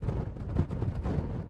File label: wind; Blind Drive